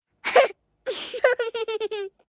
Laugh Two Parts, Laugh, 1 girl, 5 yrs, short, cute, mouth closed, human voice, child, kid, female; Laughter; VoiceBox